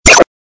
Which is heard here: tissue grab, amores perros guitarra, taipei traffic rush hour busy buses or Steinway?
amores perros guitarra